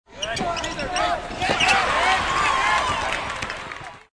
Basketball; VoiceBackgrounds

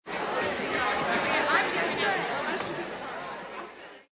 Auditorium; VoiceBackgrounds

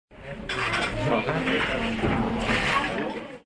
Coffee Shop; CityBackgrounds

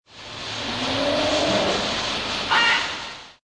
Jungle, Nature Backgrounds

